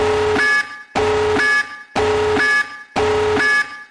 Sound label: nuclear bomb warning